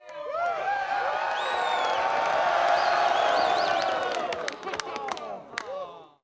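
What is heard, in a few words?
Crowd 100 Interior Cheer; medium int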